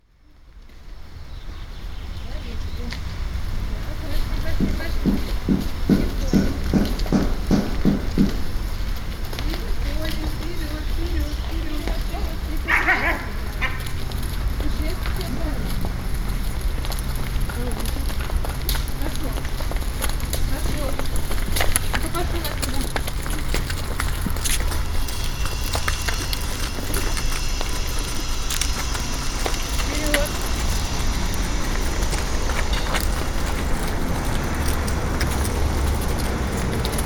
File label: two nuns driving cows, khotkovo